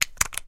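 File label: lipstick sounds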